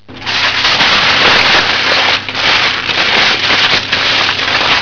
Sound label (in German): AUFREISS; 999wavs